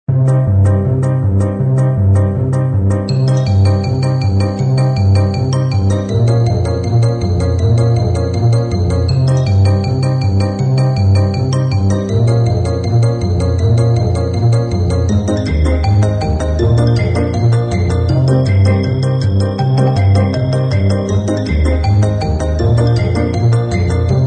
Comedy Fun, 快节奏的嗡嗡声听起来像跳跃的切分音和弦和低音，有时是快乐的，有时是恐怖的黑色舞蹈，听起来像恐怖的木琴和电子钢琴的旋律。

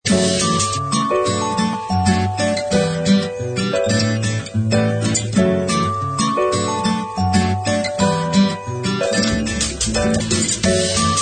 轻松愉快的跑道，带着夏日阳光的心情。

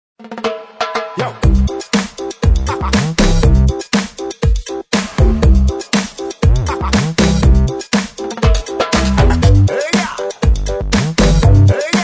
Ragga Bounce With Vocals, 一个超级有弹性，充满活力的拉加注入轨道保证提高感应和微笑对你的脸！ 从当代流行音乐影响这首歌曲编织在一起的配音，嘻哈，ragga，流行，配音步和雷鬼元素。; 拉格反弹

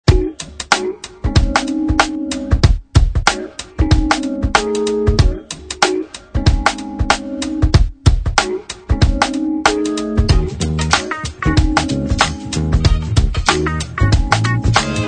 Funky Lounge Hip Hop, 在这一个你可以听到深情的钢琴，时髦的吉他，时髦的低音和老式的鼓声。

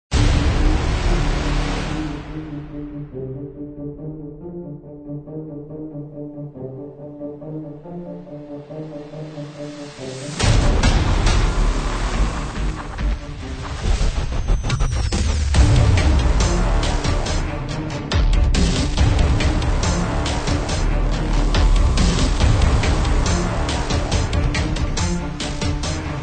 Epic Hybrid Dubstep, 是一个激烈的行动包装管弦乐 / 摇滚混合 Dubstep 轨道。 特色电影弦乐，硬打击摇滚乐器，和重调制的低音。

